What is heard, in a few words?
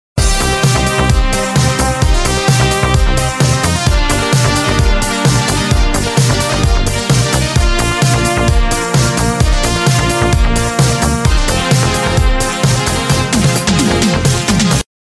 ringtone 1980 disco style